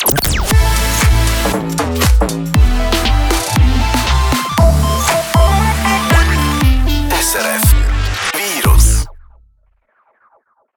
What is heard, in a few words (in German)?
Jingles Beispiel; SRF Virus